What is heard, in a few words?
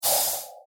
Spell Of The Magician, magic sound effect; fantasy sound effect